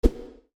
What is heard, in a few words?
woosh; kickbuttowski; mellowbrook mayhem